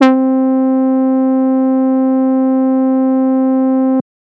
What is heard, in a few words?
Synths Note C